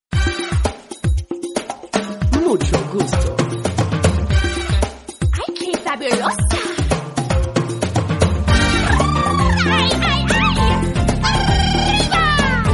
funny mexican song

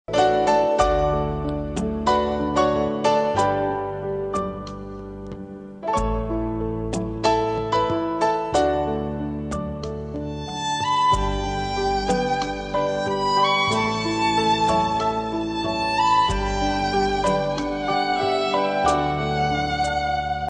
violen love, ringtone

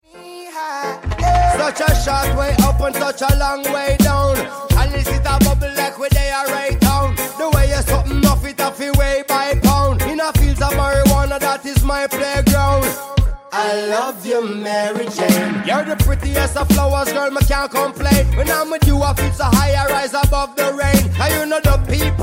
playground rap

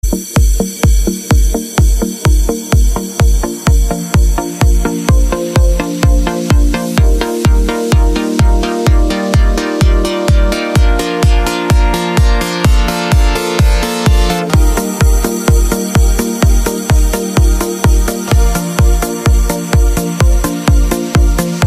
trance

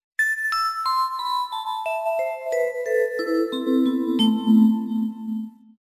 melofon sms

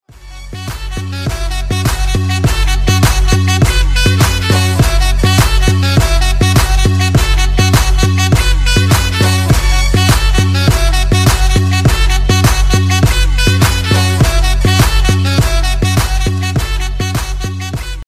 dj the horns, ringtone

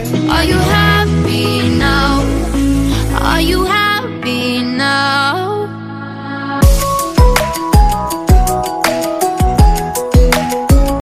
hot electro

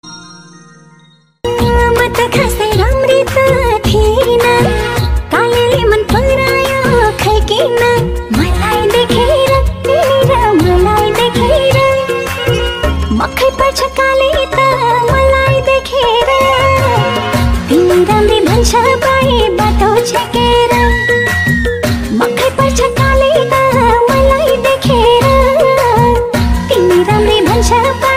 emotional nepali

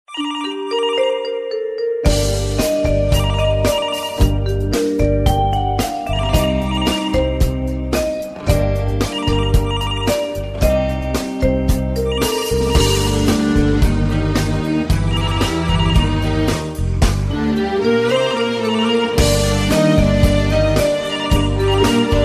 classic phone music ringtone

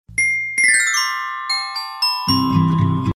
sweet sms tone

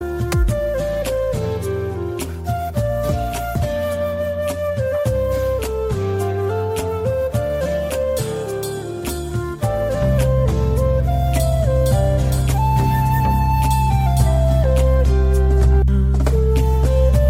bollywood flute

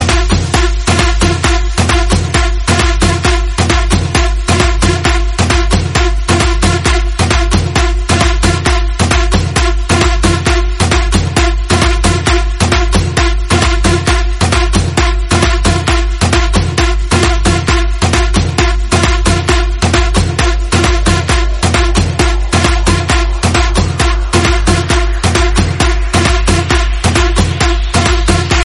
edm

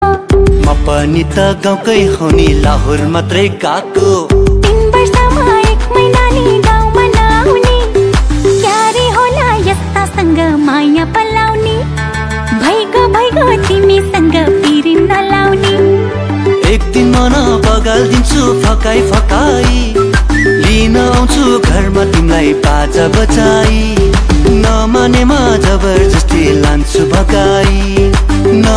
new nepali

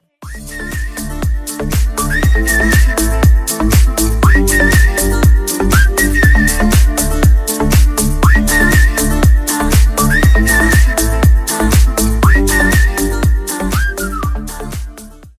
whistle; iphone 7

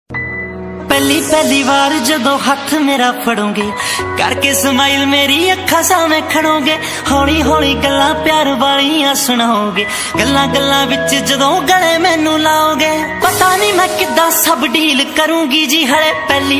punjabi

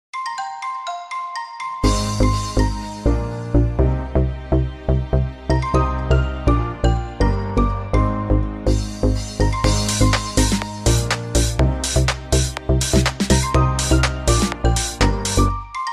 awesome romantic; iphone

